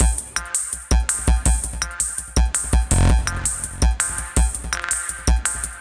C78 165 Beat, Drum Machines; Bender; Ableton

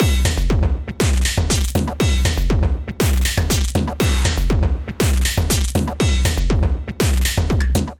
Metal Work Kit 124 bpm; MIDI Clips; Ableton; Punch and Tilt

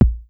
Kick; Ableton Foundation FreePacks; Foundation Drums; Ingentek

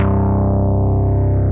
Fat Fingers SynBass; Synth Bass; Ableton; Factory Packs; Beat Tools

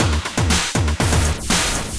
Scorched Kit, Drums; Ableton; Digicussion 2; Scorched Kits

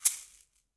Shaker, Latin Percussion; Drums; Drum Hits; Ableton; Factory Packs

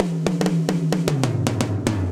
Afrobeat Fill Stretch 113bpm, Session Drums; Cop Style; Ableton; Factory Packs; Session Drums Studio

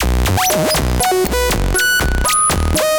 Sqeak, Synth; Ableton; Factory Packs; The Forge by Hecq

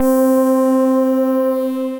Coral Guitar; Guitar & Plucked; Drive and Glow; Ableton; Factory Packs

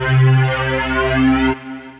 Pad, synth pad; Thunder Coast Pad; Ableton; Factory Packs; Singularities

